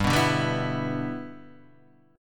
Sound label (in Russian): Akkord G6 boj